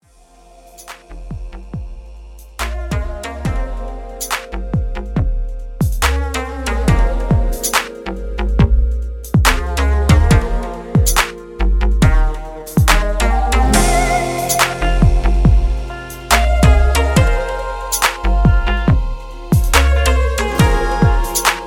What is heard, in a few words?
DUBSTEP